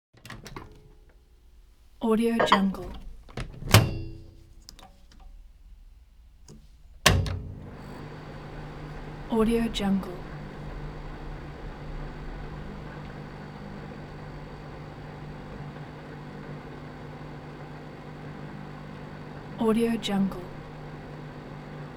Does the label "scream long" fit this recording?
No